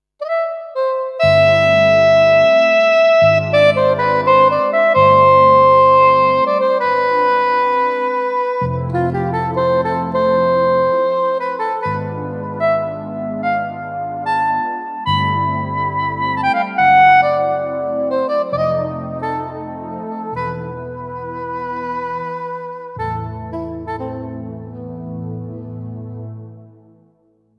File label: Soprano Sax Pad Bass